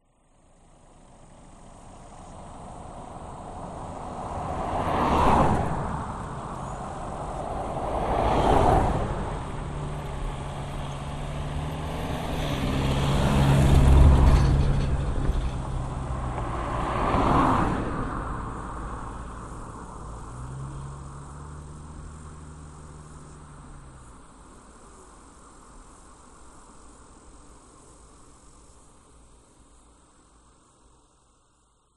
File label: vehicles cars x4 pass by road right to left 3rd pulling trailer summer insects; binaural